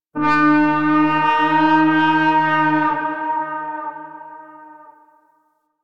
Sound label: Town Horn Alarm; Ambience; Duviri; Warframe